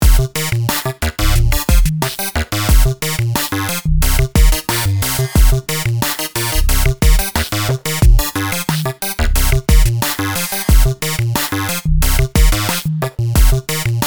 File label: auto reset drums; Numerology; Five12